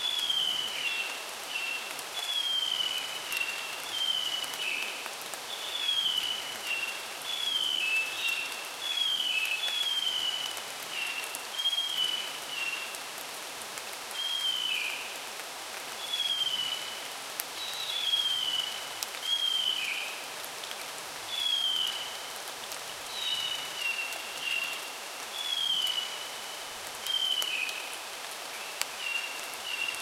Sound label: steady rainforest rain wi, bird calls